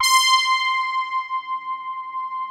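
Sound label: analog brass; Pro-Rec - Synthsonic; TRINITY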